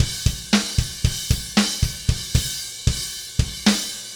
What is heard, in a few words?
Beat Fill, Indie Rock; Sonic Foundry (Sony Creative Software); Indie Rock Anthems; Session 06 - Haze